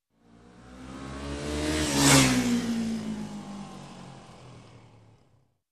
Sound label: Motorbike passing by fast